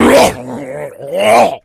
shoved, npc infected; Left 4 Dead 2; Source Engine

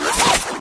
flechette, ministrider

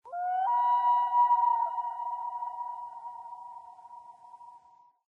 animal call bird loon; Source Engine; tf; lair

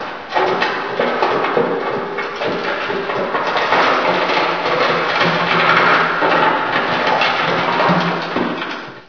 vent scurry medium; ambient; Source Engine; video game

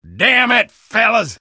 negative vocalization; engineer

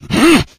rage, infected, male; Left 4 Dead 2; Source Engine

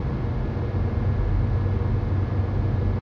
spawn tone; Source Engine; tf; lair

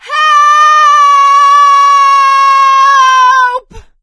fall, survivor voice; source engine; left 4 dead 2; producer

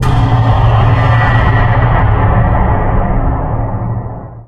mysterious perc; Source Engine; tf

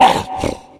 tank dormant, voice breathe; Left 4 Dead; Source Engine

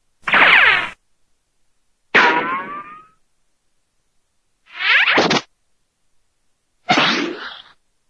ricochets pochwong pchwaaa szwipp whoof; cartoon; hannah barbera cartoon sound fx

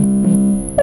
combat scan loop, scanner; Source Engine; video game; npc

